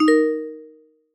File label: notification reply